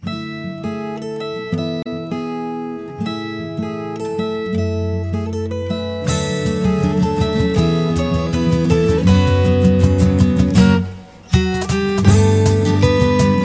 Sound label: Acoustic Arrange